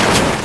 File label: explode Slot